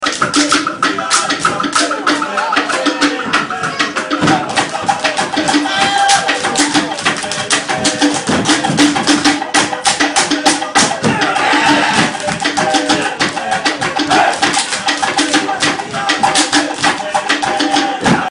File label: Samoan drumming